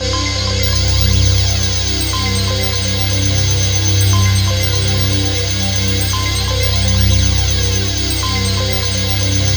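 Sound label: soundtrack, ethereal atmospheres; USB Soundscan vol.13; AKAI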